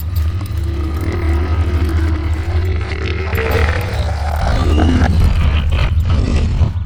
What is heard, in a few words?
Ice Giant move